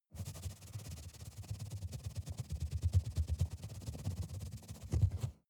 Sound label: rat movement fast scrape